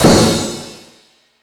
Sluggo Drums, Drum Machines

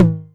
Tom Hi, Novation Drumstation 909

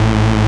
SYN TECHNO, dance synth; Sound & Vision - Gigapack I CD 2

